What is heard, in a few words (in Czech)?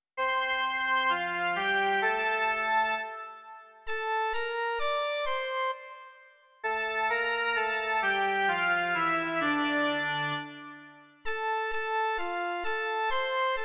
Vzhůru srdce - jen melodie; Mužská verze; Doprovody Farského liturgie